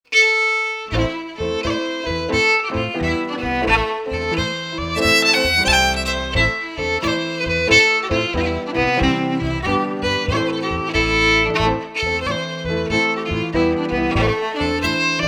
FIDDLE PIANO; Jink & Diddle Book o' Tunes 2022

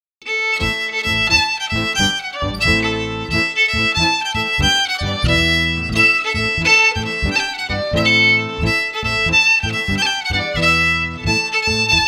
FIDDLE PIANO; Jink & Diddle Book o' Tunes 2022

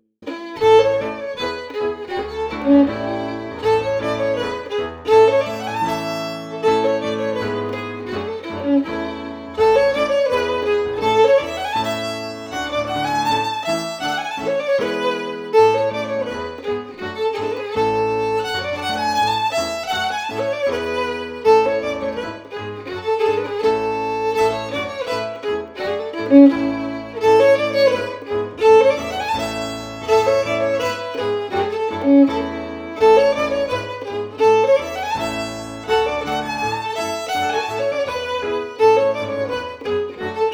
FIDDLE PIANO; Jink & Diddle Book o' Tunes 2022

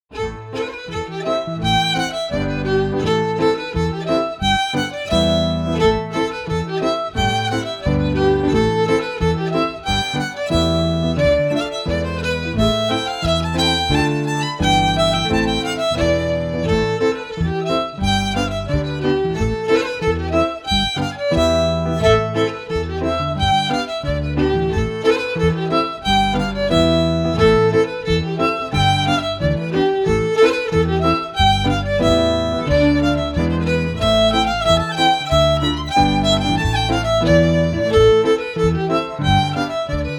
FIDDLE PIANO; Jink & Diddle Book o' Tunes 2022

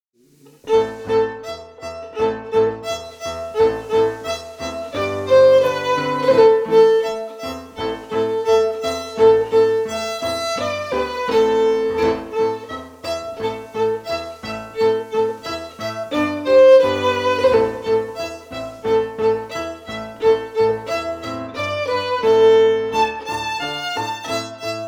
FIDDLE PIANO; Jink & Diddle Book o' Tunes 2022

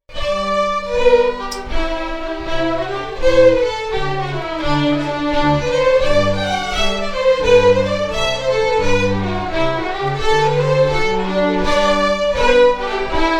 Jigg as MARCH; The Jink & Diddle School of Scottish Fiddling

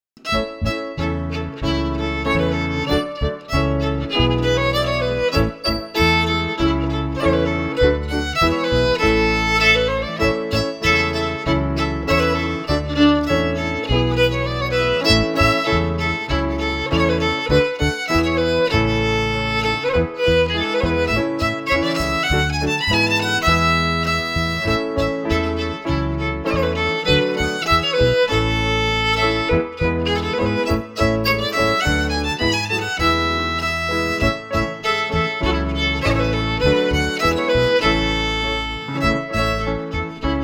FIDDLE

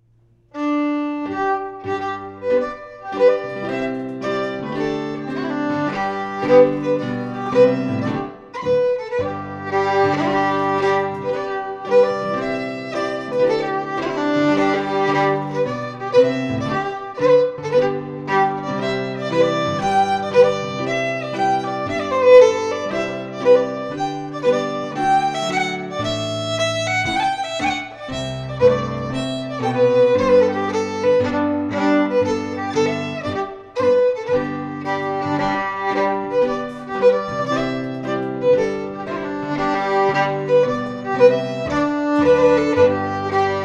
Strathspey FIDDLE PIANO; John Macglashan col 1796; The Jink & Diddle School of Scottish Fiddling

